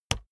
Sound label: keyboard laptop enter push hard